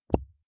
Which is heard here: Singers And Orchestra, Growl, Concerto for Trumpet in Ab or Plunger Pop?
Plunger Pop